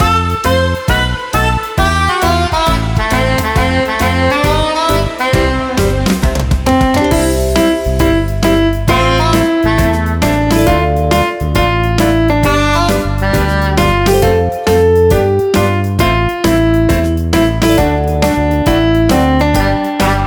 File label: karaoke